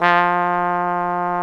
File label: BRS FFBONE F3, Trombone; Roland L-CDX-03 Disk 2